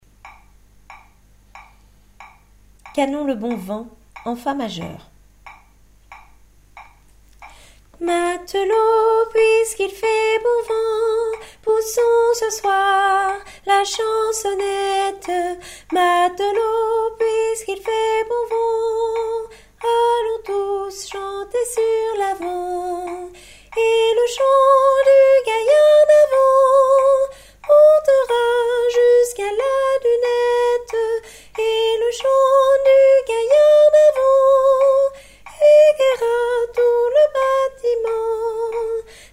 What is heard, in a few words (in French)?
canon le bon vent en fa majeur